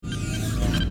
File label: forcefield off